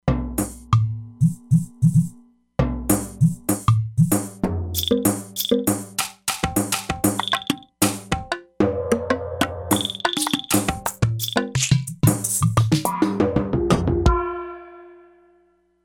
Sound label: Drum Stacks, E-mu Proteus-3; Factory patches